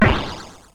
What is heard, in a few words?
SFX; Narco Police